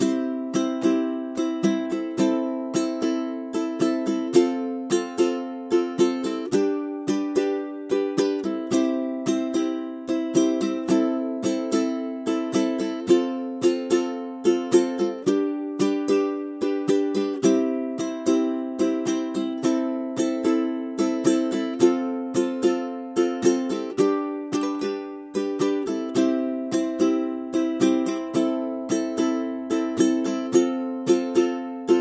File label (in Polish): bicie ukulele